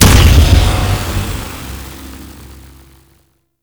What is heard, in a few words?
Magnetize Explosion; Warframe